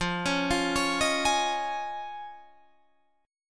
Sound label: F Minor 7th Sharp 5th, Guitar, DADGAD